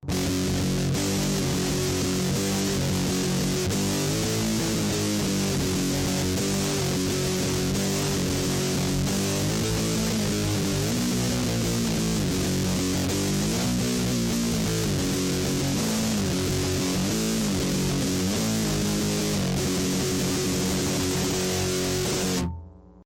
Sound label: A Very Early Riff